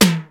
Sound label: Kit Tom; Digital Sound Factory Xtreme Lead